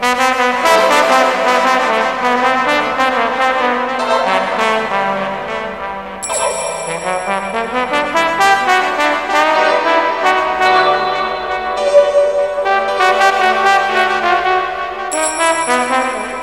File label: TROMBON FX; Ragga & Dub Reggae; BRASS KIT; AKAI; USB Soundscan vol.27